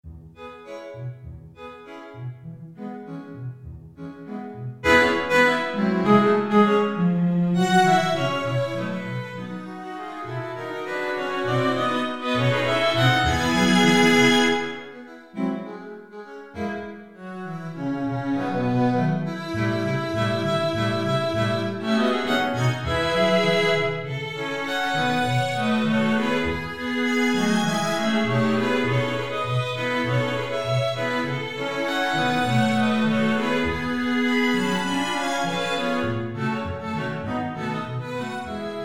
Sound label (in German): streichquintett